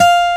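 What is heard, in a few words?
F 4 2 OVATION, acoustic guitar; AKAI S6000 CD-ROM - Volume 1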